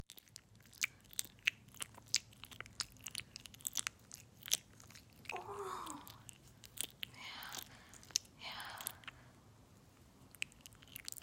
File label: Loud Wet Sounds